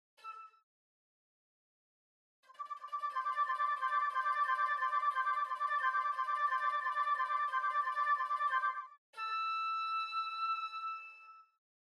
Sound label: Flute; Holst Jupiter